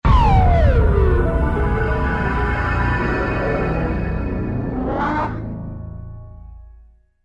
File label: lost round, music